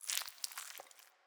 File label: hatch; alien